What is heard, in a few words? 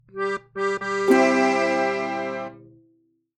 Game Success Fanfare